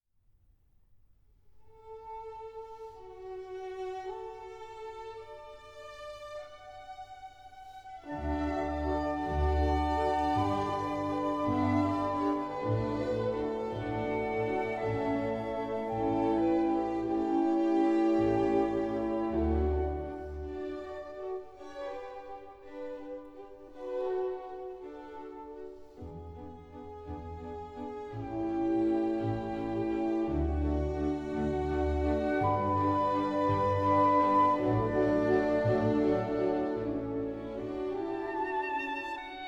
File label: Violin Concerto No. 3 Adagio; Mozart